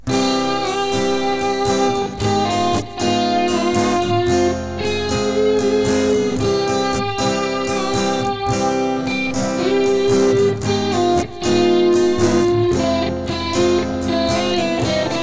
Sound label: C Abm Fm C